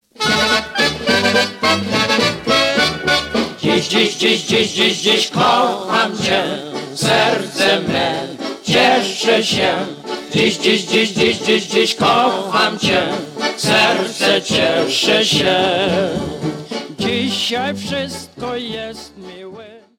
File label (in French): Oberek, Pologne